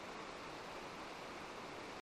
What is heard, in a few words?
reel Spin; koi princess